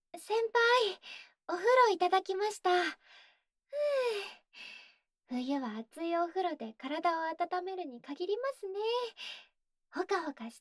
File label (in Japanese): 耳かき・吐息 SEなし; Track6 耳かきしてあげますね。【耳かき・吐息】; 大好きな彼女に もう一度会えたら 今度こそ離れない。【耳かき・料理音・添い寝・寝息】